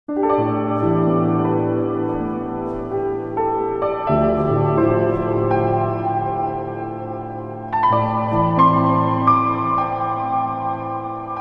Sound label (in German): Klavier NI Raum